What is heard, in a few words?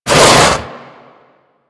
Foley Slide; Warframe; Tyl Regor